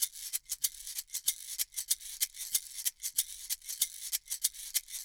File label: SHAKER, Liquid Grooves; Spectrasonics